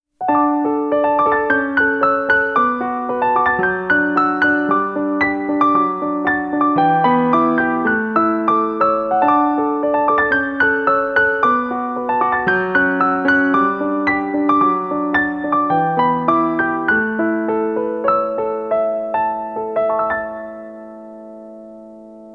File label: piano